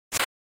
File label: SNES RPG Attack Arrow